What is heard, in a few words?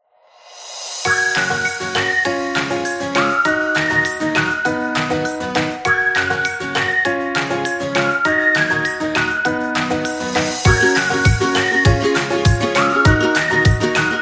Funny Happy, Kids background music